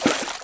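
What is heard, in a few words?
water in; lmctf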